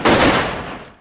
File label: single gun shot